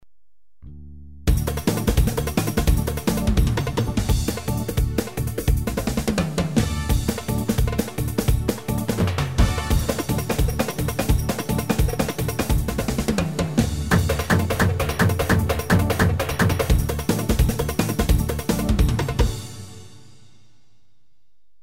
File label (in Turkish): Karadeniz, Ketron ritim